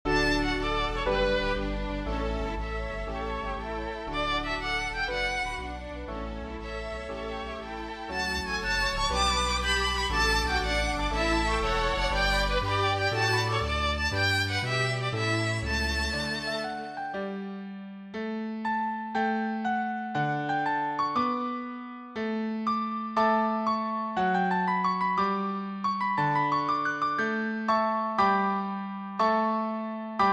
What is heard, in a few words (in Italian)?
flauto